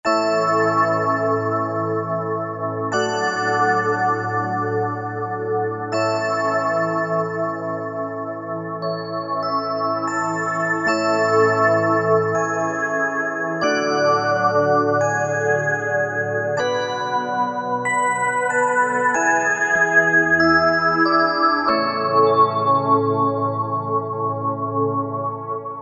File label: Organ, Korg MSC-9S; Cloud VIII